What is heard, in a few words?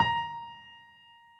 soft hitwhistle